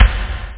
gate kick; OctaMED SoundStudio V1; jazzies samples; perc

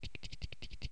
Spiders

